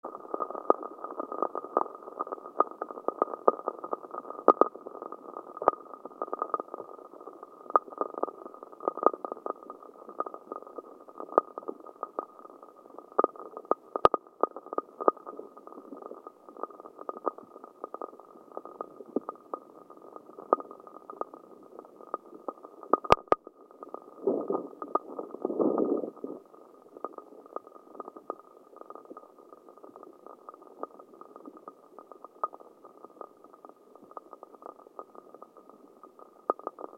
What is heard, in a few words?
filter on